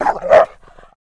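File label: Rottweiler Warble Vicious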